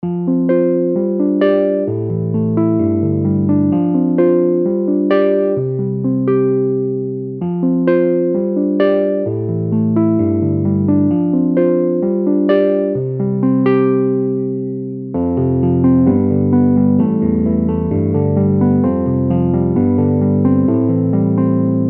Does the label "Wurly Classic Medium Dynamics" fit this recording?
Yes